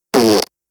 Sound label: squeaky farting contest